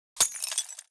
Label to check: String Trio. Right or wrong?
Wrong